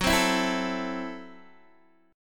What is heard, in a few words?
Gb Minor 7th Flat 5th Gb Guitar DADGAD; down; chord shape